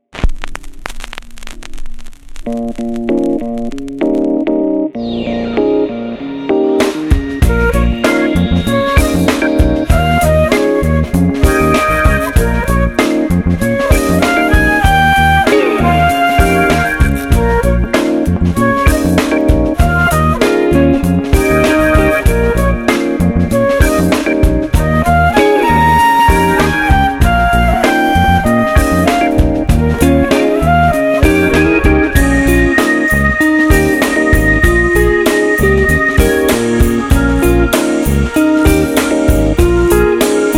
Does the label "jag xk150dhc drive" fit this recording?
No